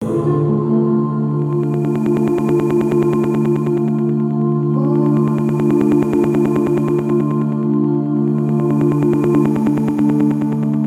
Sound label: Ghostly Somewhat Sad; CUES for film TV games etc; DARK